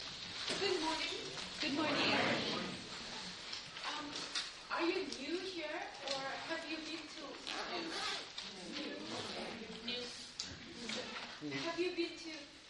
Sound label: Vocal Training; Colloquium